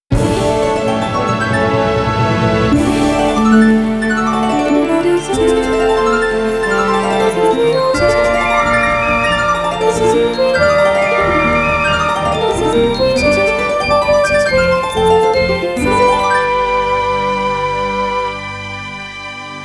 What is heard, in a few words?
Harp Performance; The Legend of Zelda: Skyward Sword